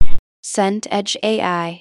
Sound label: message pop